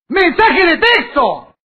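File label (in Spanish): grito y mensaje de texto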